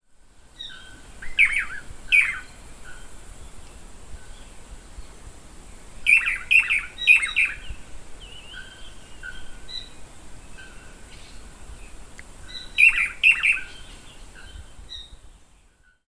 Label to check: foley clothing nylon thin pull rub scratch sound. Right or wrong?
Wrong